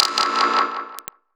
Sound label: Tauk, One Shots Hit; Sonic Foundry (Sony Creative Software) - Future Retro Dance Excursions